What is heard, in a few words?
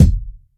thumpy bass drum one shot F key